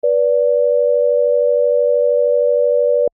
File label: alarm